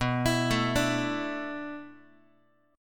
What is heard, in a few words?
B Minor 11th B down Guitar 4A 4E 5A 5D 5G sharp 6C sharp; arpeggiated; JGuitar